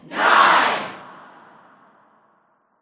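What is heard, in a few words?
Ambience Tennis Crowd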